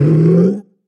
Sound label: monster roar short; soundscrate